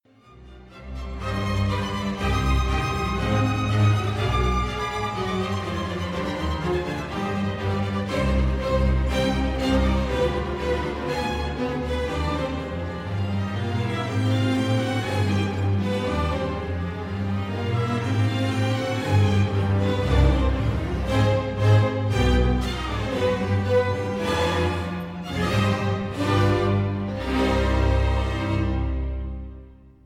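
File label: Adagio Fugue Cm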